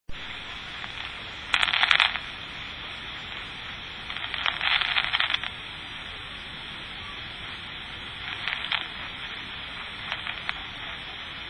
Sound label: kaminari noise